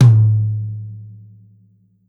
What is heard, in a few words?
hanging tom low, rock drum kit